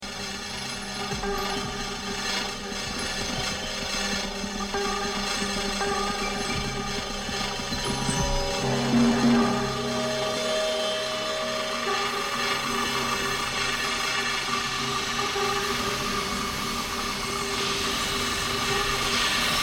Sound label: buzzing distortion